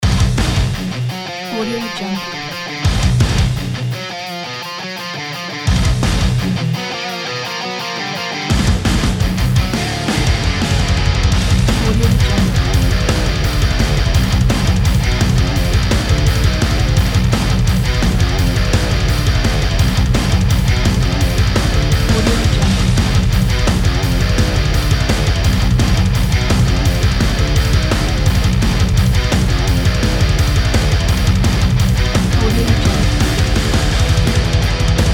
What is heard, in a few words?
metal thrasher